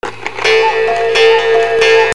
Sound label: BELLS